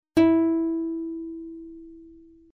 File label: Plucked string third e4; music